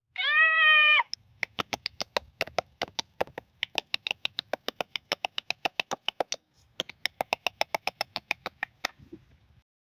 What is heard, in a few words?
cats; pets